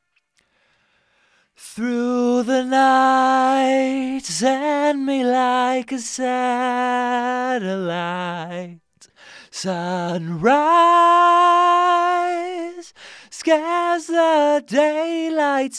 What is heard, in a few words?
vox; logic